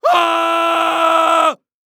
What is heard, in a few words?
Horror Scary Human Male Scream Long